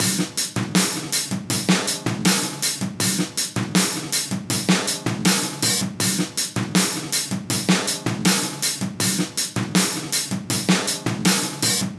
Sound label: 80 live Kitchen Funk, breaks, Live Breaks